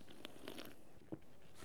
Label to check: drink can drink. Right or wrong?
Right